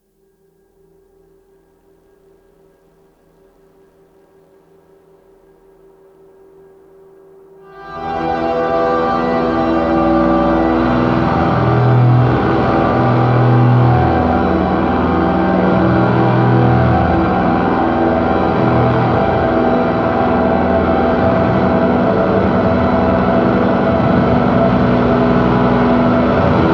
Shortwave Noisetest

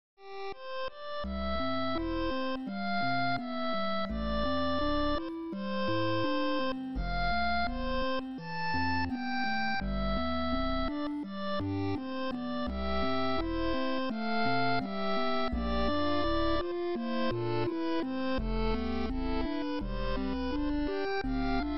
Log In; Azur Lane; android

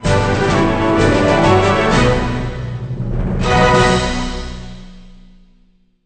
Sting Flying Success

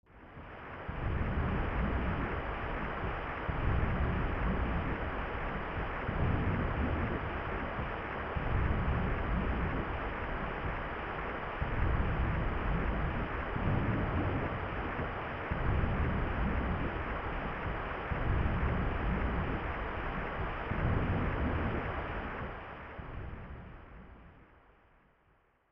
Storm; Dual Orb; SNES

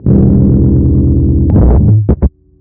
bass stopping longer

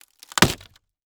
SFX wood sheet crack; a dump in the dark

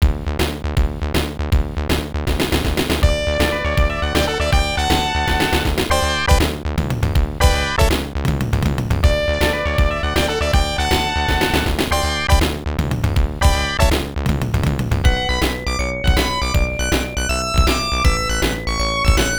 All Clear; Darius II; Sagaia; Genesis

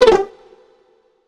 Deactivate Cheat

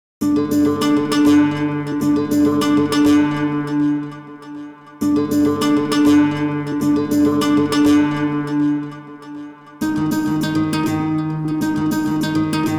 Music Loop Stem; Sniper Elite: Nazi Zombie Army 3

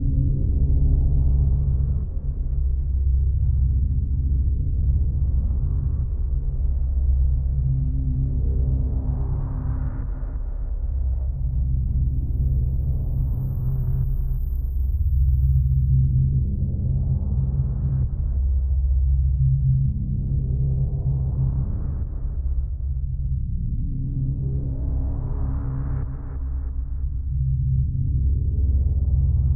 Ambience; Wick

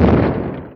Ruins Door Slam

